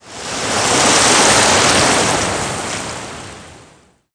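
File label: heavy rain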